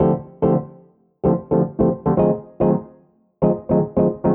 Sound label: Jazz Keys El Piano 110 D, Chordy Loops, 110bpm